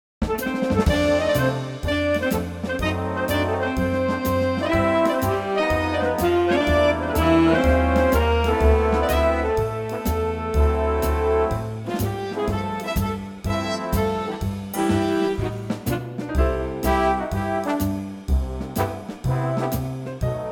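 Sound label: Big Band C, backing track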